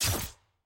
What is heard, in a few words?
aah; pyke